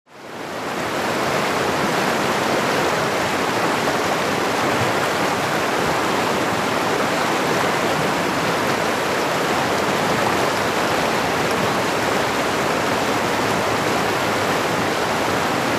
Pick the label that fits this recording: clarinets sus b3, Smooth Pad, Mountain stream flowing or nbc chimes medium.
Mountain stream flowing